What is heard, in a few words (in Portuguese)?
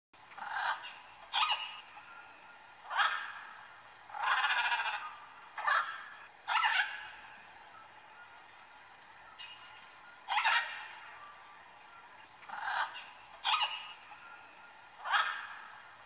Som de Arara vermelha Barulho de Arara Vermelha